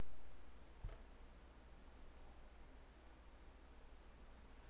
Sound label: electronic